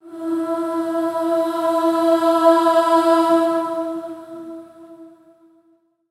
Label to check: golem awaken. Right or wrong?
Wrong